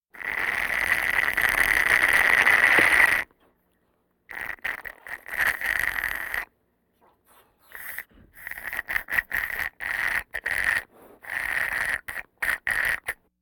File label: Vocal effects breathing Moaning Baby